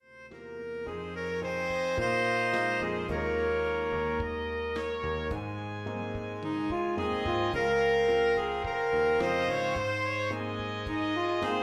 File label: for Jazz Band